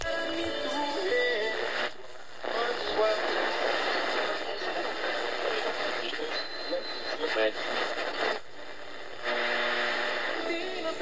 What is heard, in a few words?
Radio Static Noise; Phasmophobia